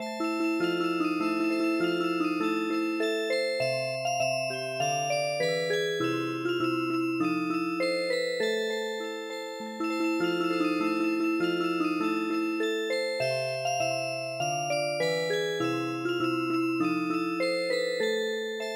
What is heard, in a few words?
Music Box; daichi ni hana ga saite iru